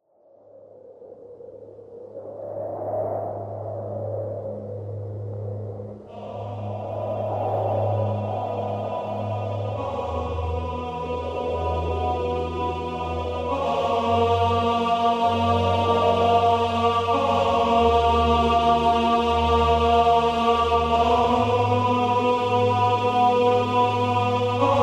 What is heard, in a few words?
vocal